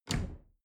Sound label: dishwasher door snap close